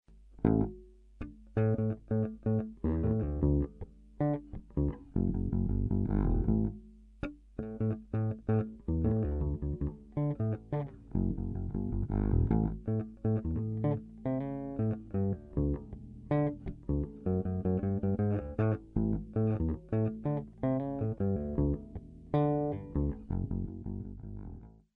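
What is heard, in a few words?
1969 EB3, bass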